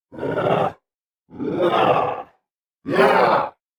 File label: Male Zombie Roar